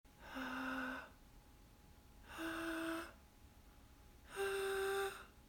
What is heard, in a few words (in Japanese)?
純粋な裏声